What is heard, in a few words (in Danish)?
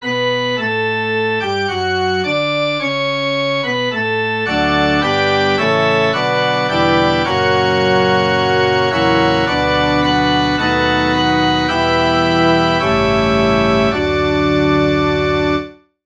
forspil; ddk 23 barn jesus i en krybbe l